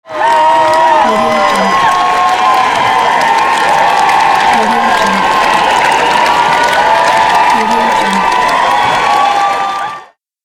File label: applause crowd show; تشویق جمعیت در نمایش; human sounds